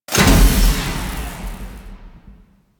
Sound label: Sabotage Panel Deactivate; Warframe; Guild